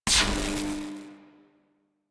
Scrap Container Start; Warframe; Missions; Zariman; Zariman Assassinate

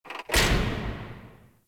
Worker Lever Push; Warframe; Venus Open World; Venus Solaris United

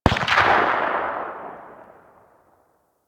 Weapon Enemy Tail Outdoor; Warframe; Weapons

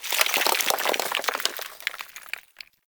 Falling Sand Rock; Ambience; Man In The Wall

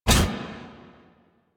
Scan Impact Pre; Warframe; Warframes; Frumentarius

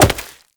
Wall Jump Rock

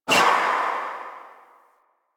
Focus Generic Buff Indicator; Warframe

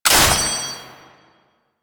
Sniper Last Shot; DE; Warframe; Warframes; Frumentarius; ExaltedSniper

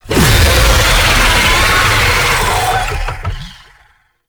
Boss Vocal Spider Summon; Warframe; Oraxia

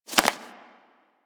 Foley Idle Ear Flap; Enemies; Warframe; Catbrow

